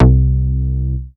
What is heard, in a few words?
C3, BASS; Q Up Arts; Dream Experience; BASS BANK 12